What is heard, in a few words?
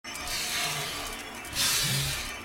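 lizard Stun; Yasher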